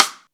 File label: SNR RIM; Back In Time Records - Big Bang